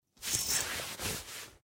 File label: Brocade Curtain; Blind Quest - The Frost Demon